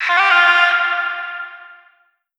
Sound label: Omnivox oneshot F, Vocal; One Shots; Slate Digital Omnivox Vocal Sample Pack